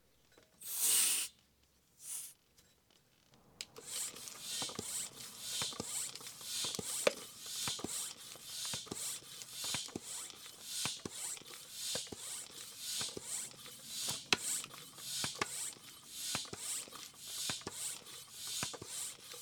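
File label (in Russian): nasos ruchnoi avtomobilnyi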